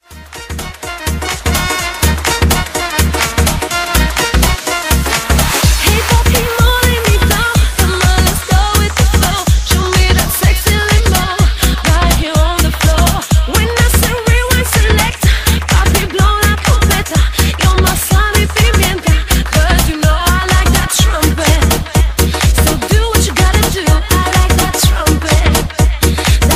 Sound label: i like the trumpet